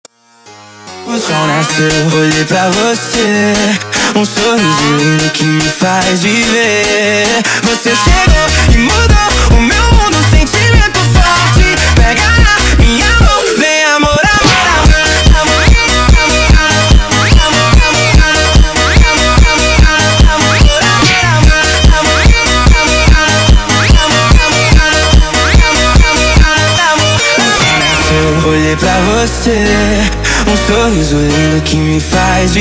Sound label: Funk Amor sped Up